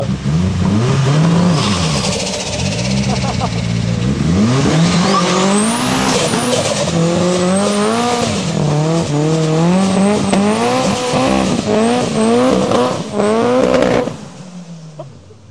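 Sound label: Power Guitar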